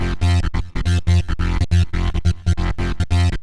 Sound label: Trance Bassloop 140bpm, Bass Arps